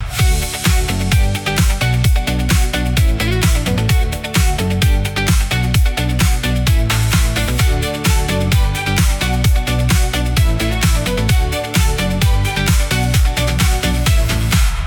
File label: loop